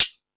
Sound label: FX Sounds, Drum Machines